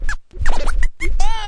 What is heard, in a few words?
turntable, scratching and turntablism; DRUM LOOPS and BREAKS